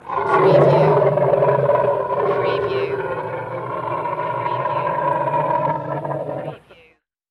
Elephant Growl

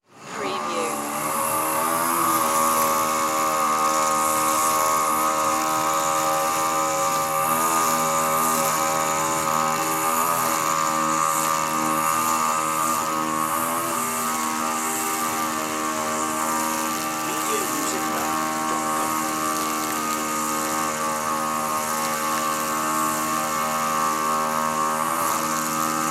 lawn trimmer

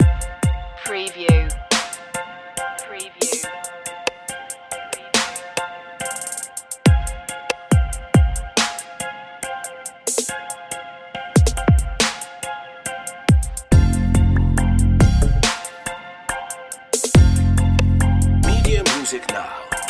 your own trap underscore

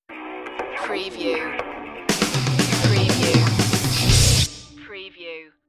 armageddon stinger

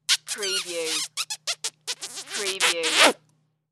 Long Squeal Kiss; Sounds of kisses and kissing sound effects.

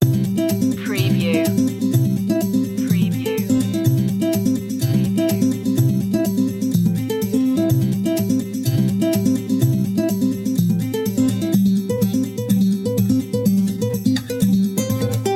folk coachella loop

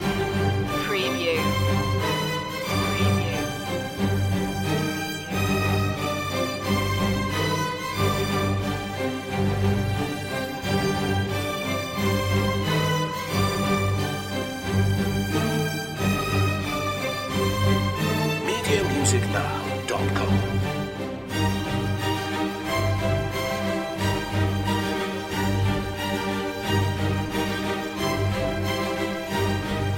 strings mix

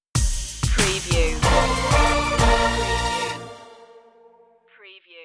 shake shake stinger

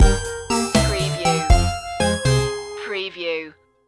retro video game lazy world stinger

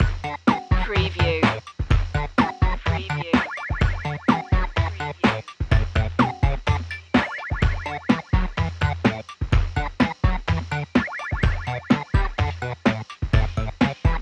8-bit instincts loop

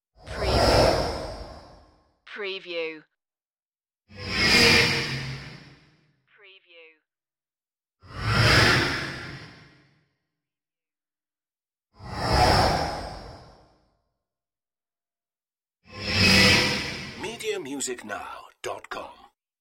space elevator passing

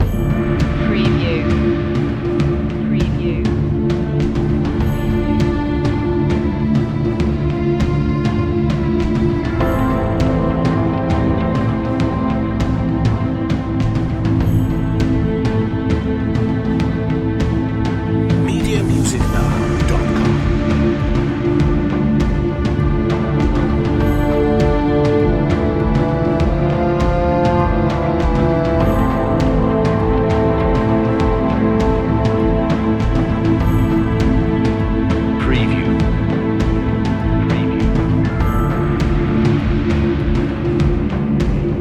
ambient army

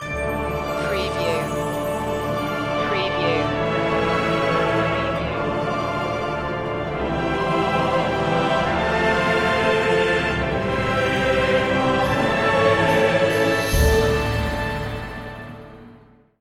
no solo voice

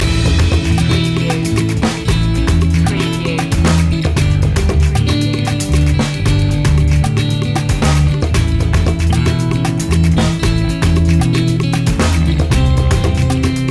traveland loop

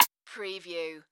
Multimedia Percussion

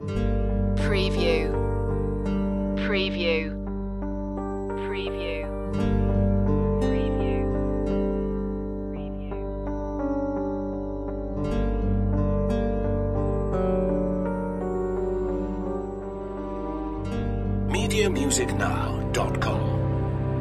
beneath the surface loop

